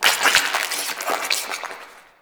Sentient Lair Flesh Tunnel Critter; Warframe

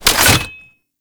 Shield And Sword Heavy Charge; Mortifor

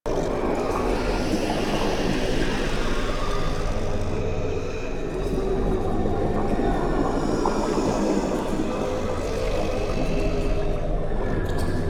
Corruption Pickup Large Loop; Warframe; Missions; Zariman

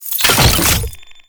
Exterminate Key Deposit; Warframe; Nineteeen Ninety Nine

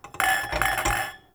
Glasses Clink; Ambience; Warframe; TheNewWar; Zariman

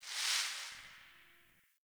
Garbage Plastic Bag Rustle

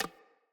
Bow Grab; Weapons; Player; Bows; Hunter; EnvoyHunterBowRangedMelee

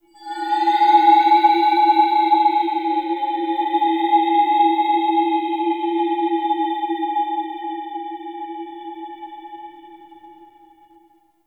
Bell Weep; Warframe; Orokin; Ambience; Interior

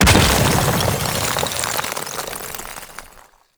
Exterior Ice Chunk Explosion A; Ambience; Gameplay; Warframe; Corpus Railjack; Comet Shredder

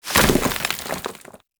Animal Despawn Dirt Dive; Hunting Critters; Foley

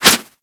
Melee Swipe; Warframe; Kubrow

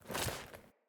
Foley Run Default, Default Clothing

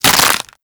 Stealth Neck Snap; Warframe; Characters; Tenno

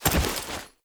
Tenno Vault Up Generic, Foley; Warframe

